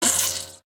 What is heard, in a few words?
death; vex3